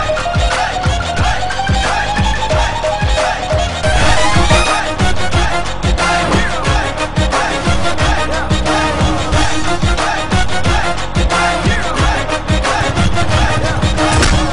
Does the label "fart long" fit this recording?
No